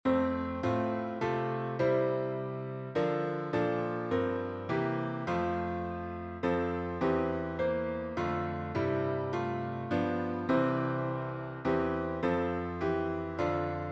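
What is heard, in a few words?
F Major; Little Free Music Library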